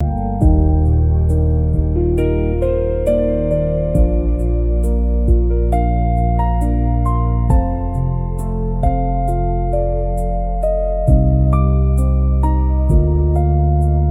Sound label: loop; bgm